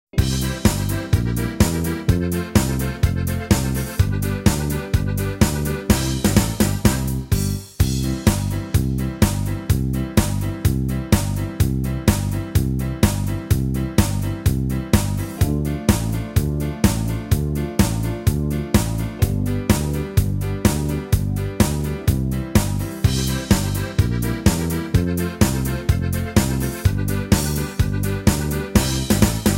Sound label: karaoke